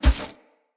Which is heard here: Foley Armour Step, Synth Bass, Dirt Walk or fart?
Foley Armour Step